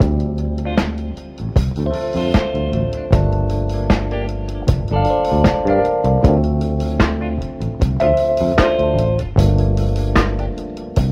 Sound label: full trumpet flower, Phonky Loops